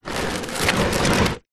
foley container tub plastic pull drag scrape concrete light grit